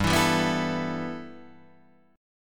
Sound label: G 6th Add 9th, Guitar, Open D